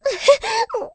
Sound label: Sob; Beth